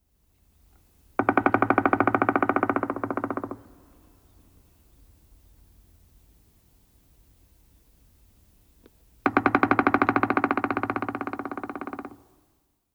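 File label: pileated woodpecker sounds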